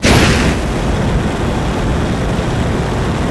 Flame Thrower Shot Loop; Weapons

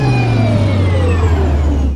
Scifi Power Down Generator; Energy & Forcefields

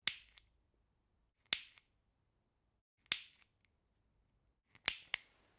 Generic Button Plastic Tiny

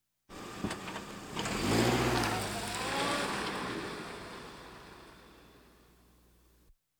Automobiles various

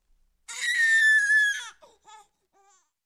Baby Single Scream, Human; Sound Ideas 2000 Series

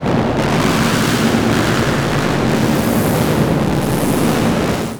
Static; Blastwave

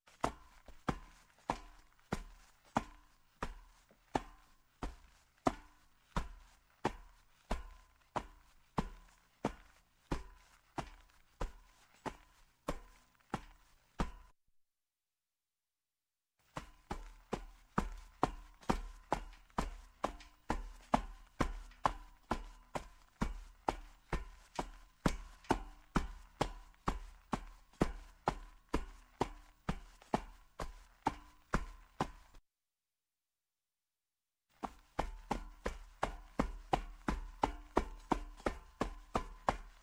Footsteps, Metal Down Metal Steps Sneakers; Sound Ideas 2000 Series

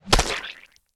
GORE Splat Hit Bubbles; mono; GORE & SPLATS; Universal Sound FX

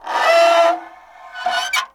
Scrape Metal On Metal; SFX Kit; Creaks, Scrapes, & Misc

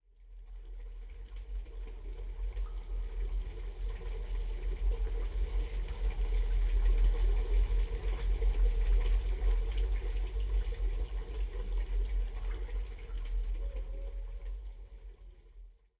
Pump Ambi; Pitch Black Audio Pong

